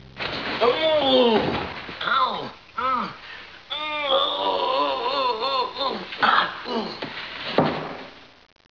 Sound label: oohoww